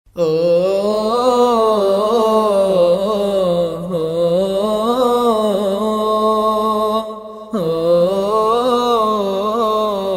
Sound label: vocal; مذهبی